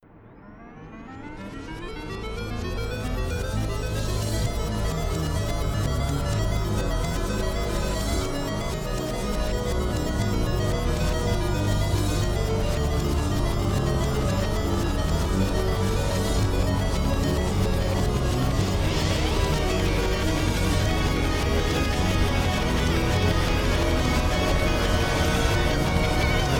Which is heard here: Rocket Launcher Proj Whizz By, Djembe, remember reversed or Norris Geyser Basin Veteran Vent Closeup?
remember reversed